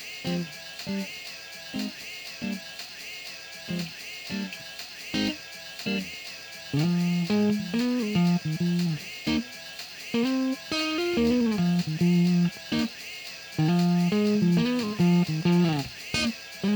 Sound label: Kemper Studio Profile noise